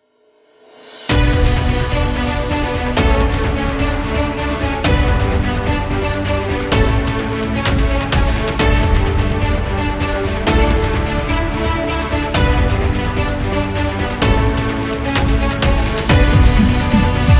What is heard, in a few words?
Epic Cinematic Dubstep; epic trailer